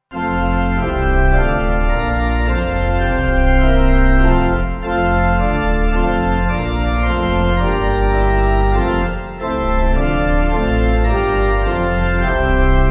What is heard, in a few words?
pipe